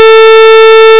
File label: square